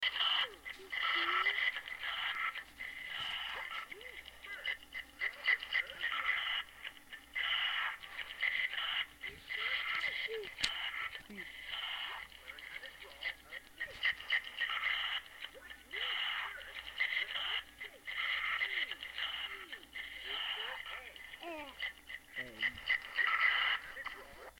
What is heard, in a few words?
Breath